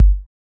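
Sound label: Bd, bass drum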